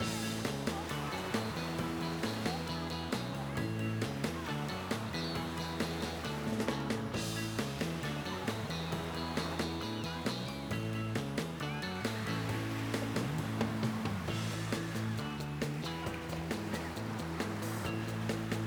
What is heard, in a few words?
Wild Water Main Ambience